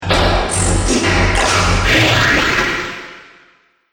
Starship Pulse Charging Missile Launch; Spacecraft Sound Effects

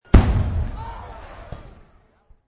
Big Fr Wrk, Massive firework bang with cheering.; Chinese New Year

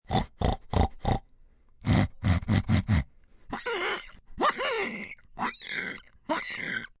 Pig, Animal sounds, pig, oink (human voice); Pigs; VoiceBox

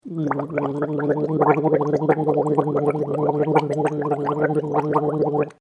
Gurgle Man Gurgling Long Gurle; human sound effects; LYN116 package of human sound effects; Gargling Sound Effects

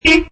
Funny Horn, Button sound; Buttons (Sound Effects:Multimedia)

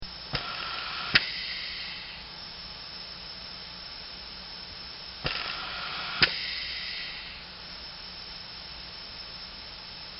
Anesthesia Ventilator

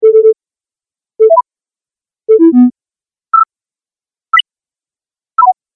Sine Wave Confirmation Collection, beep

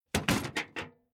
Tool Box Placed On Ground Metal Rattling; Two Impacts

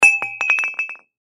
Wine Bottle Drop Cement; Drops; Foley; Objects; Blastwave

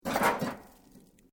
METAL SIGN MOVE; Sound Effects:Materials; Foundation3

